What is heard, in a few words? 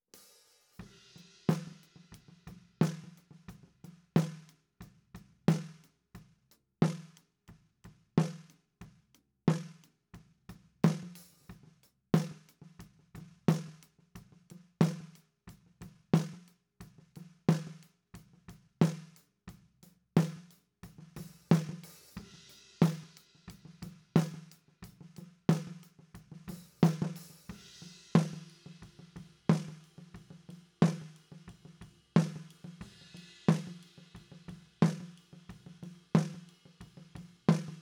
SNR